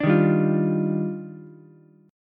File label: D sharp Major 9th D sharp Ukulele C6, chord; strummed; 7,3,3,8; JGuitar; D#M9 Chord